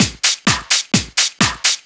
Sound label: Straight Loop 128 BPM, House